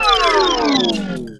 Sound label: Slow